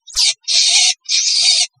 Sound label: indiv call agitated, vswift